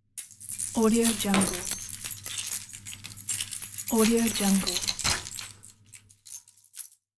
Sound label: keys